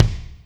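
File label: kick Deep Room, Alesis D4 drum machine; 300 Drum Machines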